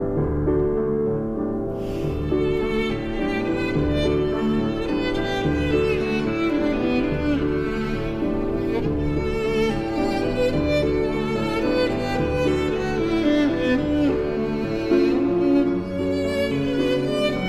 Violin and Piano in D

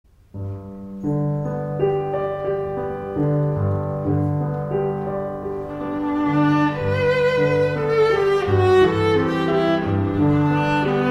Romance for Viola and Piano

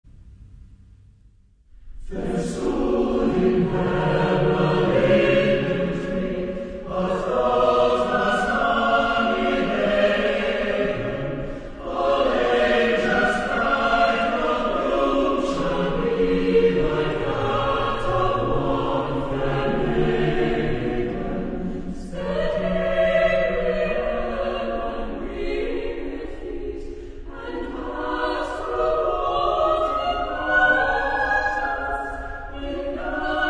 Cathedral Choir, Carol; The Linden Tree Carol

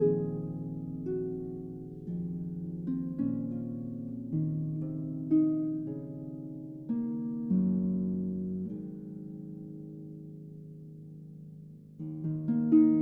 Harp Concerto Lullaby

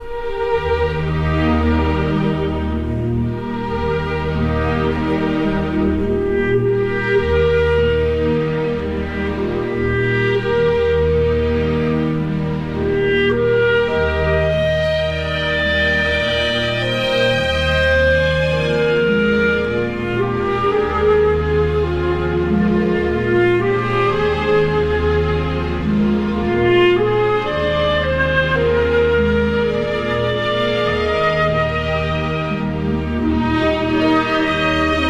Wiegenlied- Guten Abend, gut'Nacht; Cradle Song

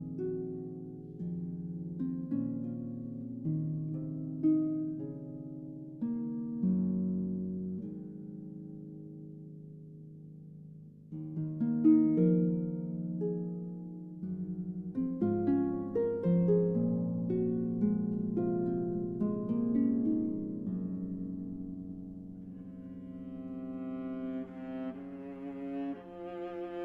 Harp Concerto Lullaby

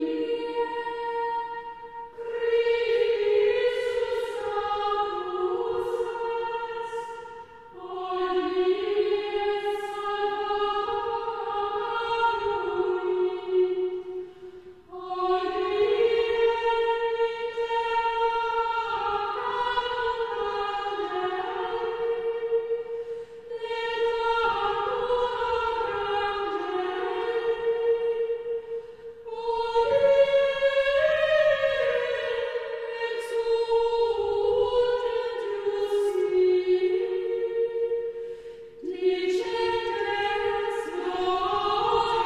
Chant Antiphon; Traditional; Chester Processional

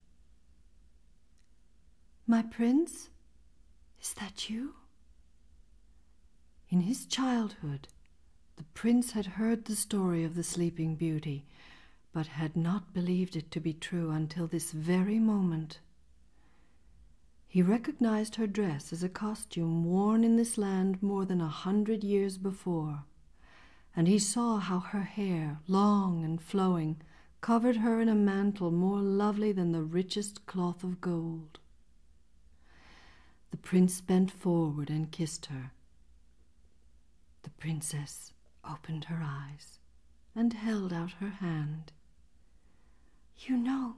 Mother Goose Suite (with narration)

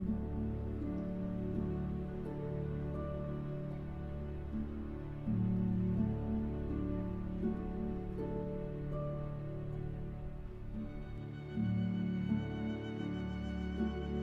Meditation for violin and orchestra; Thais

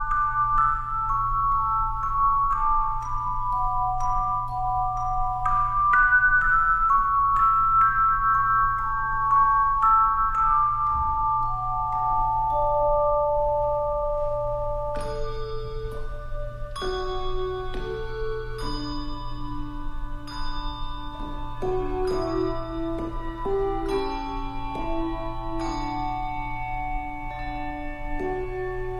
Handbell Choir; Austrian Carol; Christmas

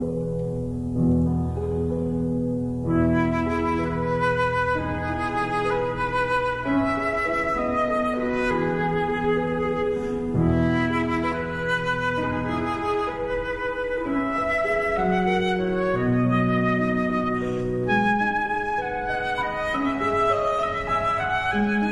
Berceuse (Lullaby) for flute and piano; Gaubert, Philippe

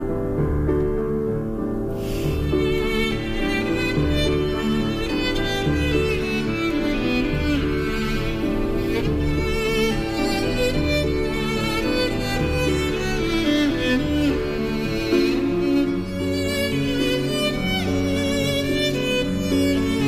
Violin and Piano in D

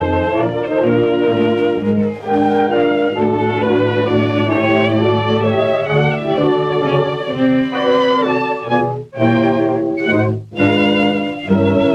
Mirimba Band & Vocal Trio; 1925

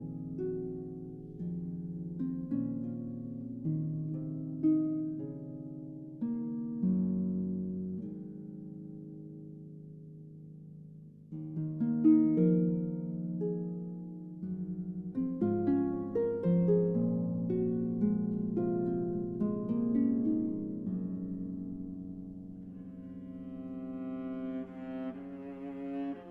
Harp Concerto Lullaby

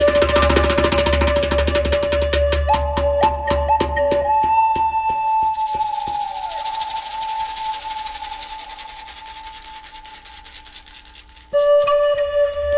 Native American flute

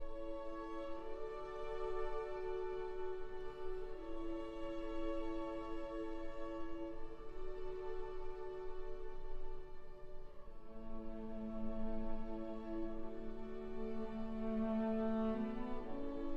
for cello and strings

